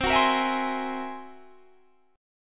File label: F sharp 9th Flat 5th, C